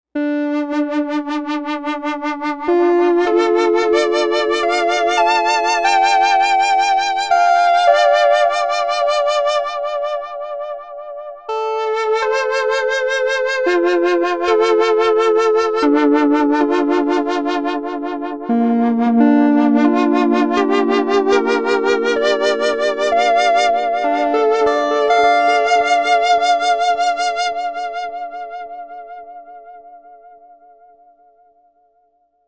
Jupey Flute

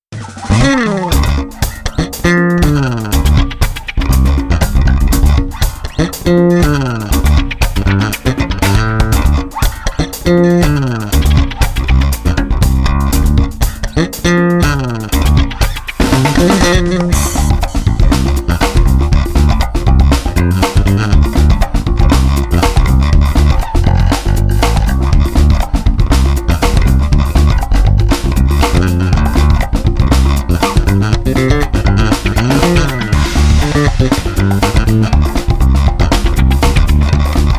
me-20b patch; ME-20B Bank 5, No. 1; Bright sound suitable for finger picking.

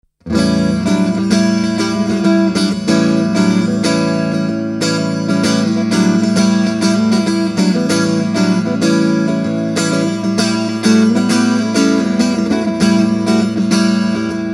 acoustic sim; cube-80gx

